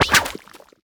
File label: Background Otter attack